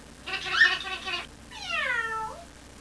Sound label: bud kitty meow